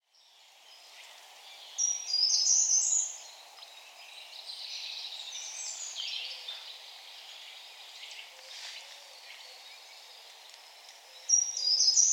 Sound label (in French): grimpereau proche mesange bleue